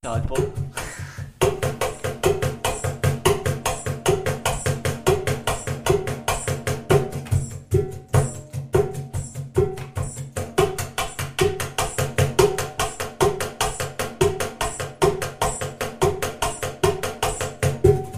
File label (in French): Rythmes intro